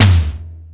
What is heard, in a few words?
Toms 1, drum machine; E-MU Pro-Cussion-1